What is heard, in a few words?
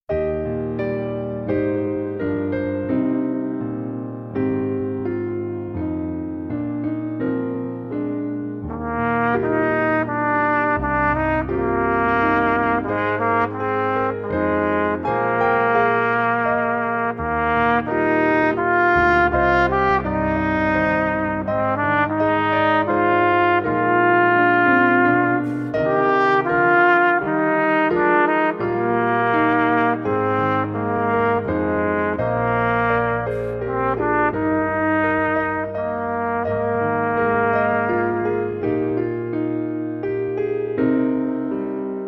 qn=84, trumpet; Hal Leonard